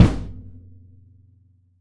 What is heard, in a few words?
JAZZKIT BD, Drums Acoustic; AKAI; JAZZKIT 1; 90s sample CD; USB Soundscan vol.10